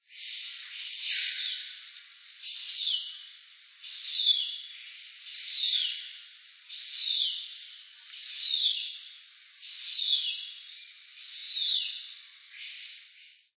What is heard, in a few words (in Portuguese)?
uiracu-falso